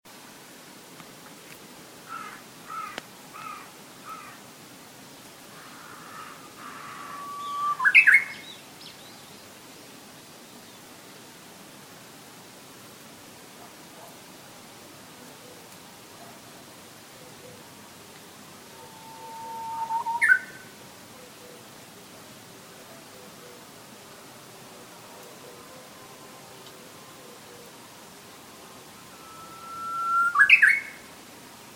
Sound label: uguisu